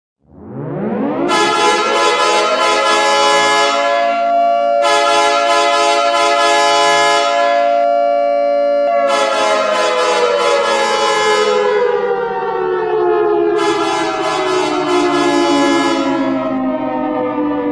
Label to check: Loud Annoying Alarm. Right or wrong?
Right